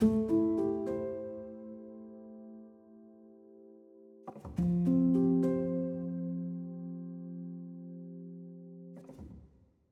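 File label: bgm lowkey 105bpm C major; meadows; 16beats; chicory a colorful tale; ps4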